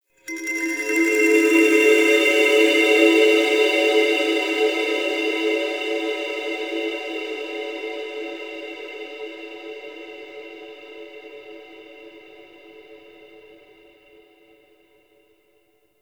Kalimba G, Ambience; Warframe; Nokko Colony

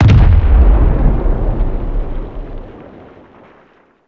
Teralyst Far Step; Enemies; Steps; Warframe; Eidolon

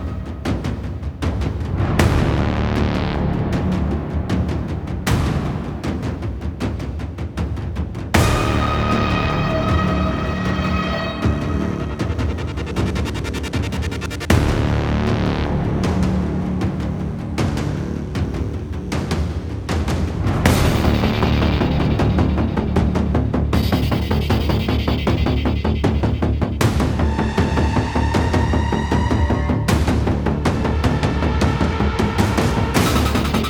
Fight Slow Walk End Loop; Warframe; Cinematics; New War; Mission Six; Ballas

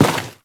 Worker Step Default; Warframe; Solaris

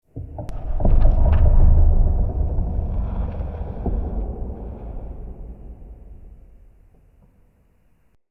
Root Cave Low Creaks; Ambience; Neathuns Root Cave

